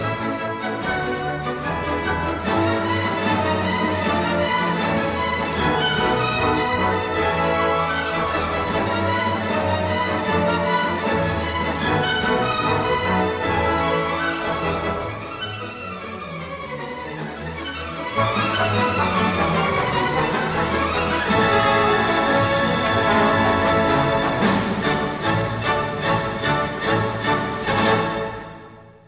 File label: Orchestra; Otto Ackermann Philharmonia